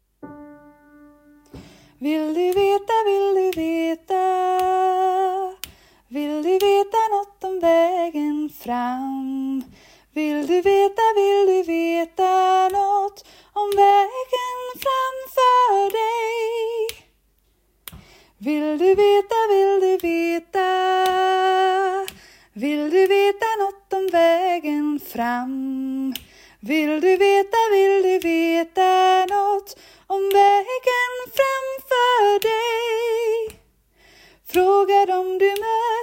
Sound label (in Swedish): vill du veta sopran; stambank